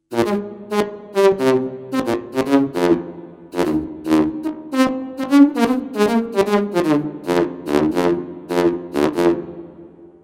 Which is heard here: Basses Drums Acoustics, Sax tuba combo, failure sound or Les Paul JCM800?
Sax tuba combo